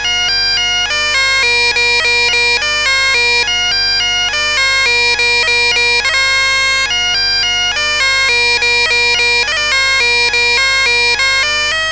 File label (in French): Jigs galiciennes